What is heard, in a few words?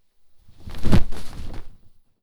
clothes hit drop hard